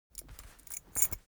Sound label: cloth key ring small trousers pocket put; foley; smartsoundfx